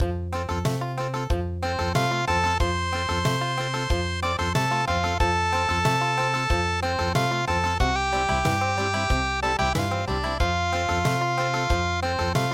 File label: The Stage Coach country